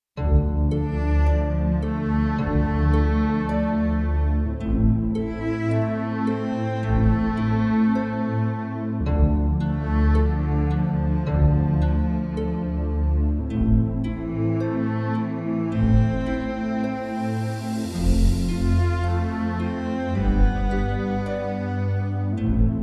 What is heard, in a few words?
Strings of Sadness